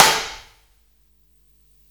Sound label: Hihat, Psychedelic Drums